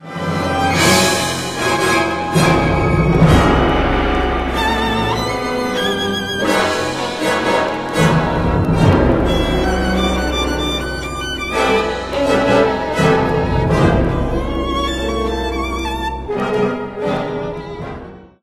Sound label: Berg vn conc third movement climax